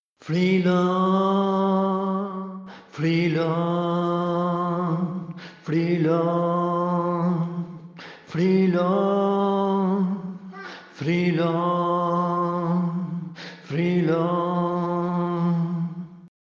coro voz

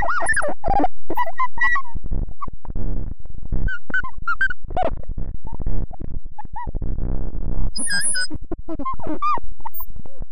Ruidos, Sonidos sintéticos hechos con Reaktor; reaktor sintesis sintetizador; Channels: 2 Samplerate: 44100 Genre: Electronic Instrument: Sinthesizer

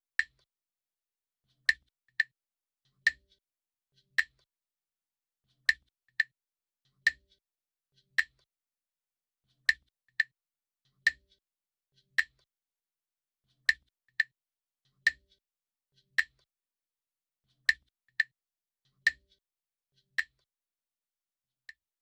Clave

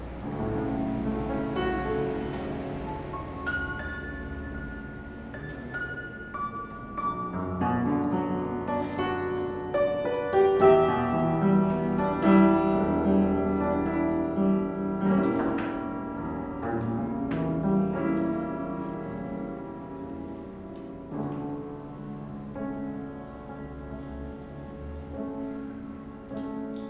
Loops mutantes piano cama

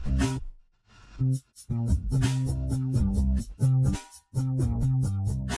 Sonidos Mutantes

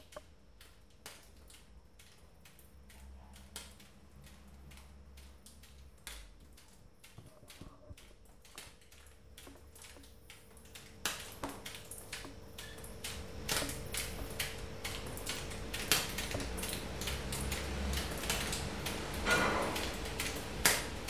Gotas

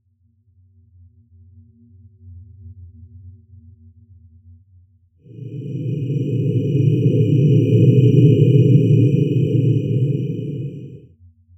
Loops mutantes

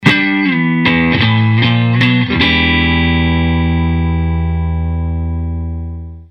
Riff rabioso

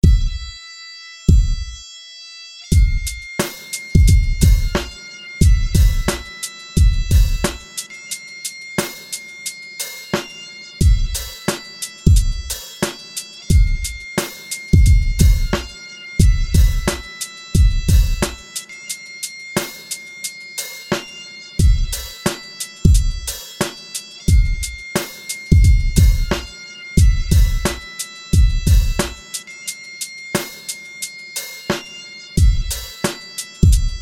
bateria

